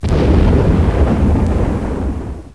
big bang

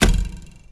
Axe Impact; Worms3D

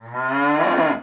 Cow Fall; Worms3D

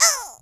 oof; speech

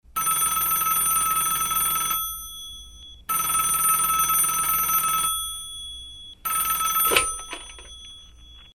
Phone ring

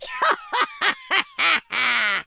laugh, pirate

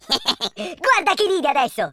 laugh, Italian; speech

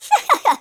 laugh, Geordie; speech

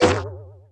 Rope Impact; Ninja; Worms3D

